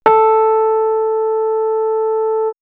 Rhodes; X-Static Goldmine; backtothe70's

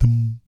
BASS THUM; Spectrasonics; Vocal Planet; Jazz FX

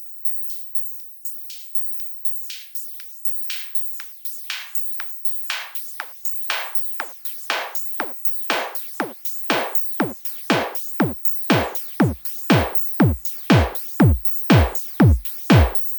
Beat, Drums, Eclectic Electronica

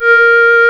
REED A#; Big Fish Audio; Synclav FM

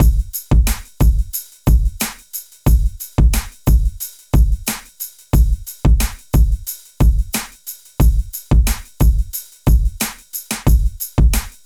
HIPHOP; INIS; Funky Xtreams

